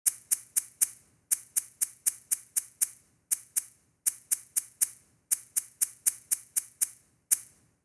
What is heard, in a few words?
Egg shaker simple rythm